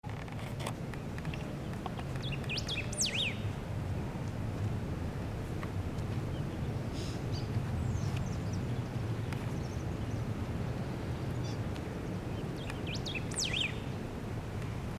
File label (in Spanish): cardenal común voz